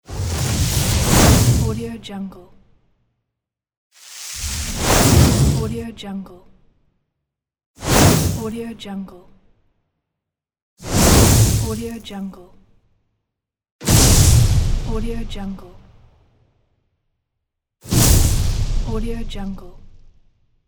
fire magic

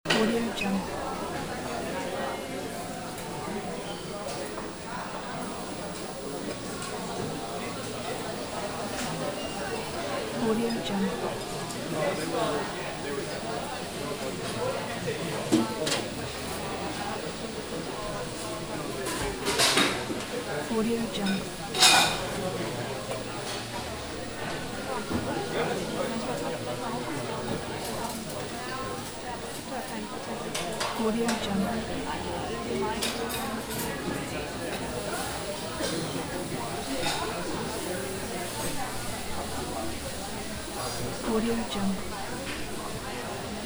restaurant; sviridpavlov

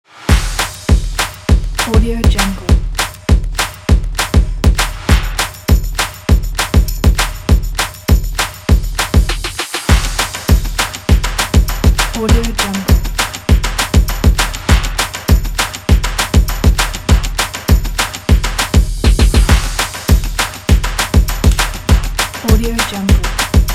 promo

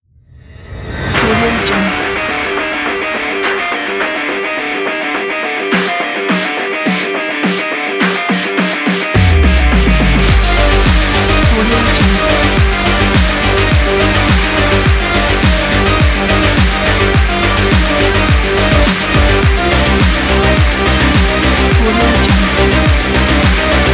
action drama electro

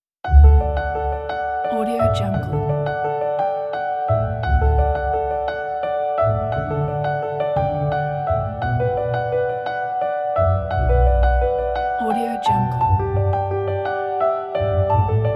emotional piano cinematic

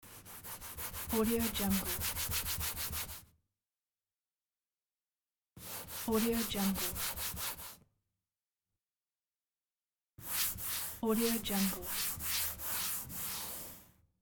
sponge wipe scrub

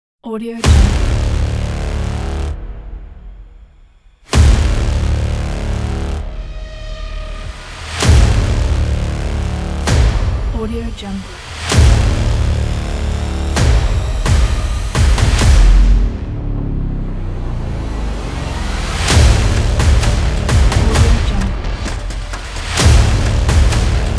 intense trailer

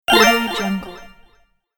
online gambling bonus

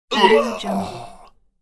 fat man kill moan

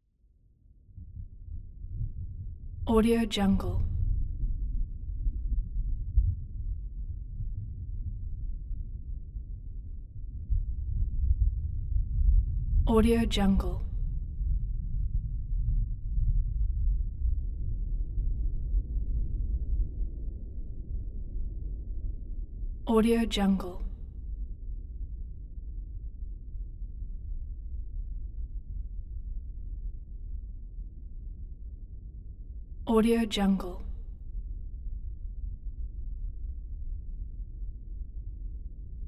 cloud rumble constant distant thunder like roll; prosoundfx

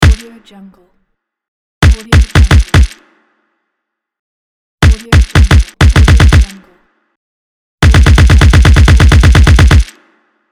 machine gun

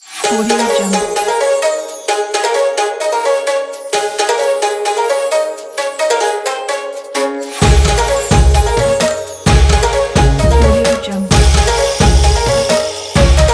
the chinese new year

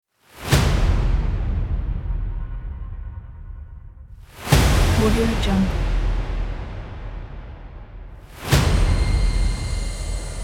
paranormal zombie

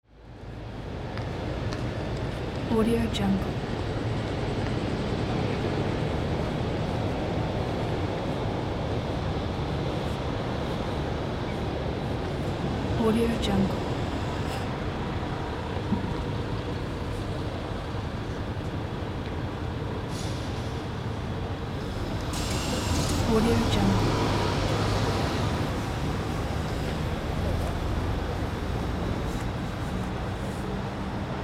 city traffic ambience

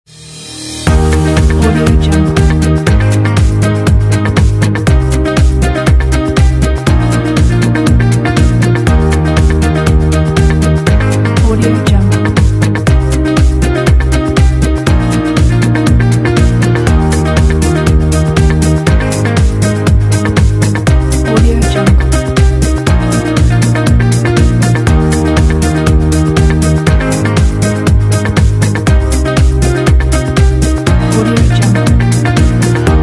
corporate advertising

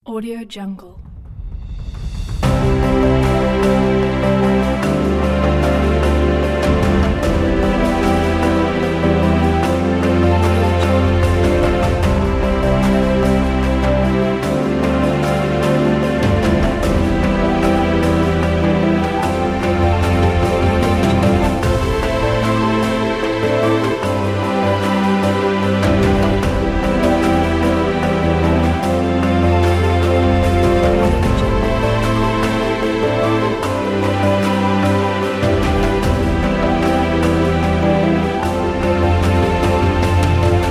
epic travel in wildlife africa

